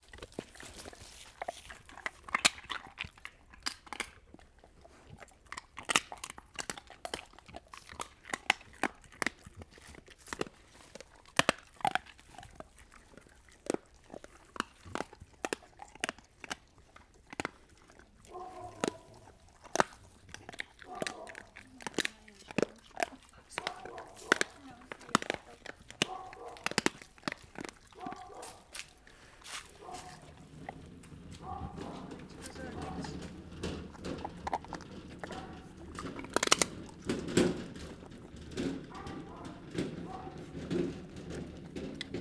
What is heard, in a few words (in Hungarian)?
dio tropogtato kutyak; kutya 2016; varboc